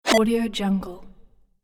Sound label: videogame bleep swipe